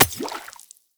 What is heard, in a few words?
Throwing Knives Impact Water; Weapon Impacts; Player; Environment